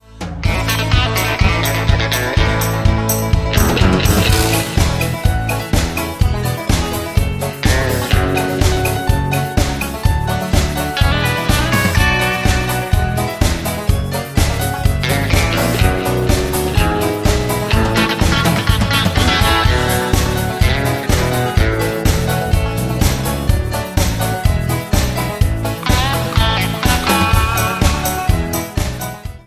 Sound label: karaoke